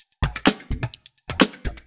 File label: Electro Loop 128BPM; Vengeance Samples